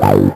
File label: Acid Sounds G, Synths; Vengeance Samples